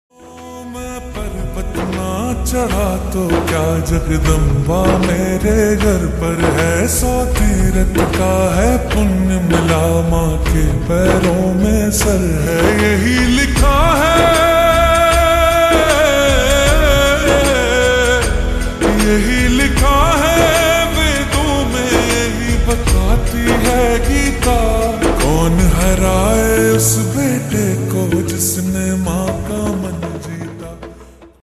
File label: Slowed Reverb Ringtone